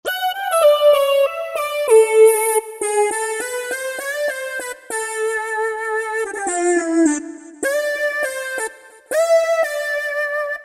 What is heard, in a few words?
Lead, Roland JD-990; Factory Patches; World -(.)- Lead